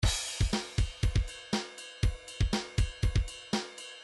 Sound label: rock 2 bar, midi